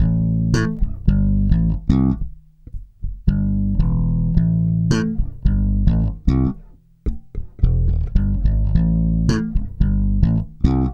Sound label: SLAP 110 E, Bass Grooves; USB Soundscan vol.30; AKAI